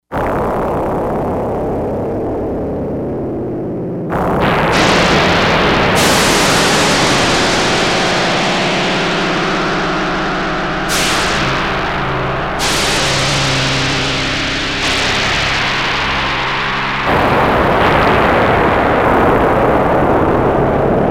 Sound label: Storm Wind, Yamaha DX100; Factory Patches